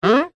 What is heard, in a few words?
foley plastic squeak rub finger single